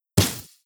arrow split; weapons; dota; medusa

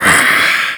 death skeleton, creep deaths; dota

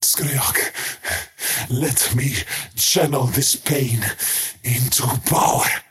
skywrath crown cast pain; skywrath mage

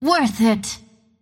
deny pain; Windrunner

